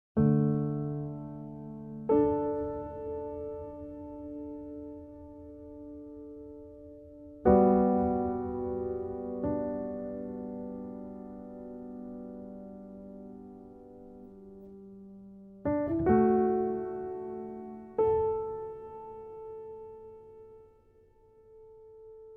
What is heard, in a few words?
Piano Interlude